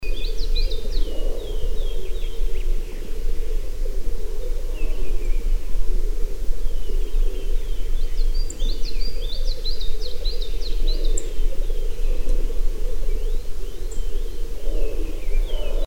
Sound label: sthrush philomelos FC; dzhukey